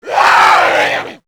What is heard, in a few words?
kid zombie attack; nmrih; childrenofthedead; zerosiki; slender